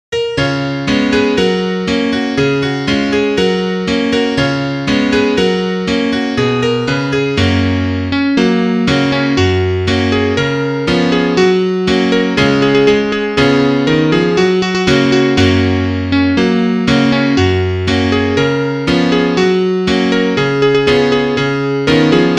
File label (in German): Klavier Solo, trompete; Etüde in As - Dur